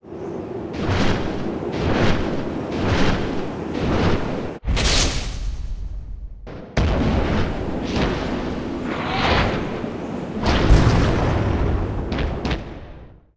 Appear

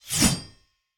Sword Slice; Super Mombo Quest

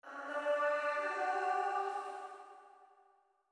Chime; chime sharp

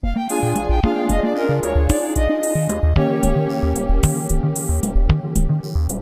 Lose Life; Donkey Kong Country 4 The Kongs Return Demo 2; Bullfrog Bog

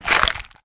Crock Carnivor; Puddle Plus

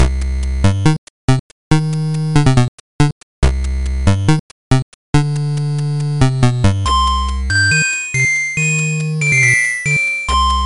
Haunted Game Fast; Super Mario Maker for Nintendo 3DS

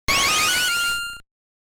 Obtain Grand Item; 8bit Hero; Wii U

